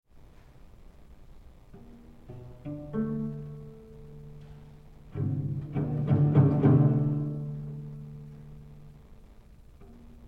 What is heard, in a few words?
Sonata for Solo Cello, Fantasia, LIVE; Crumb